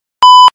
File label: Censor Beep